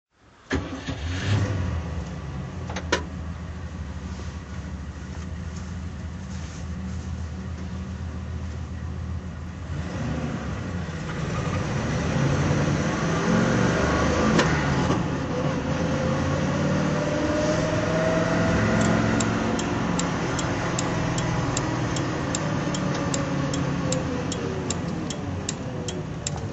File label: rover p5b rally drive